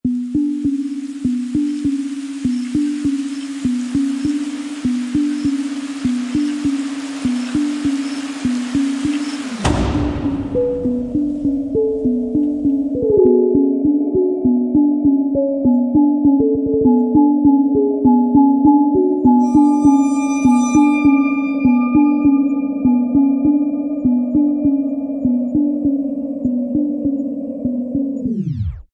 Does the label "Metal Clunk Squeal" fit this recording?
No